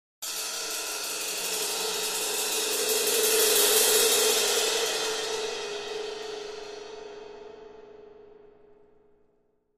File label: Cymbal Two Crescendo